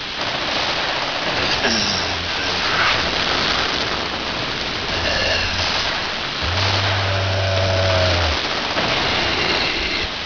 radio zombie

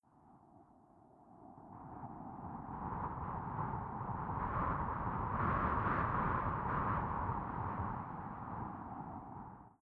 wind attic

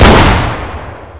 shotgun fire safe

